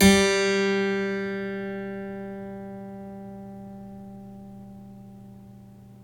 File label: Clavecin G4, Harpsichord; Giga Samples Collection